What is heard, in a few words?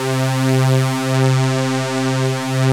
VS strings; Greytsounds; Scott Peers Sound Engineering